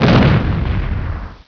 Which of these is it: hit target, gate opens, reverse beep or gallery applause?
hit target